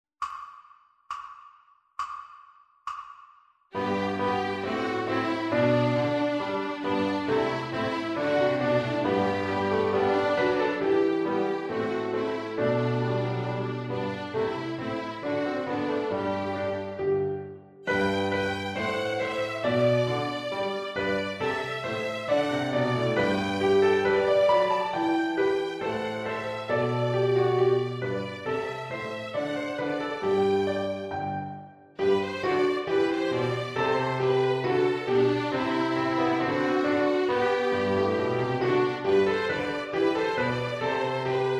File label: Violin Piano Backing Track; Rigaudon; ViolinSchool